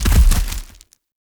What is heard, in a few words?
Rock Trap Rock Fall; Objects; Ode Lantern Crate